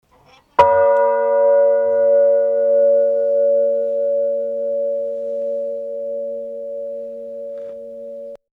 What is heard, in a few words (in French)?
cloche; Parthenay